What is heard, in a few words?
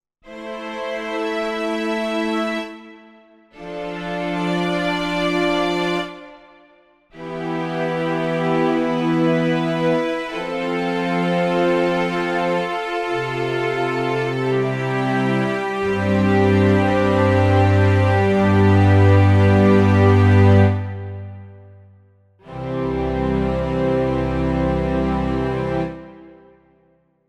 Yamaha PSR SX700 SX900 Strings